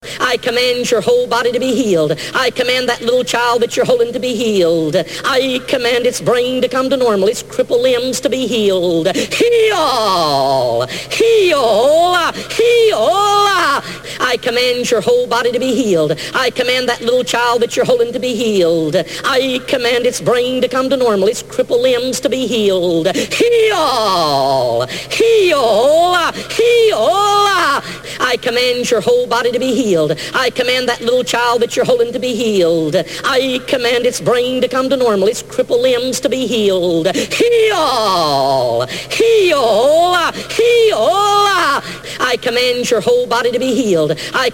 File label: sample loop